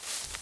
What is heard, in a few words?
grass; firefight